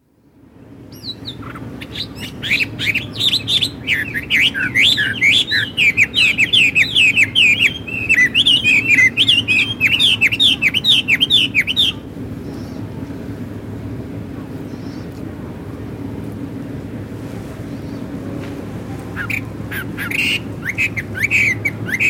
Wuhan: Lakeside atmosphere, with Blackbird's song (lake near Wuhan); Nature; BBC